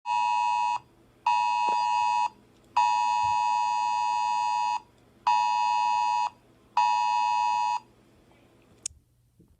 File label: cell broadcast iphone